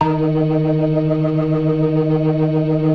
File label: DRAWBAR VIB, Synth and Organ